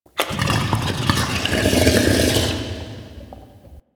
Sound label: interior gurgle slurps; Ambience; Misc Indoor; DE; Warframe; Infested Eris